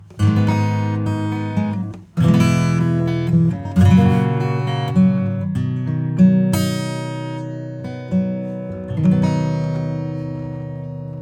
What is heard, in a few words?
acoustic; Kelvin